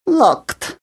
locked; BattleSNDS

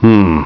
humm; BattleSNDS

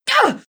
hit; BattleSNDS

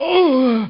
DYING; BattleSNDS

